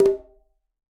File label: Quinto B Flam Opn, Conga; Latin Percussion; Drums; Drum Hits; Ableton; Factory Packs